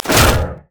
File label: frost flamebreath; LoL; shyvana